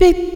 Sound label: VOX, Funky Vocals, ADLIB WOM; USB Soundscan vol.18; AKAI